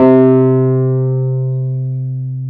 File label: PNO T.Wurly C 4; Keyboards of The 60's and 70's